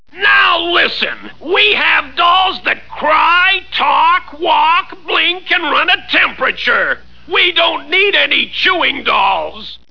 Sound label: chewing dolls; Schuetzenchor